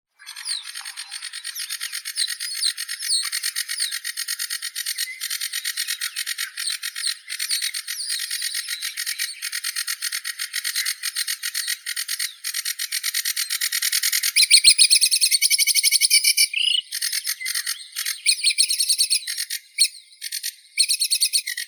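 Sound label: sedge warbler, Acrocephalus schoenobaenus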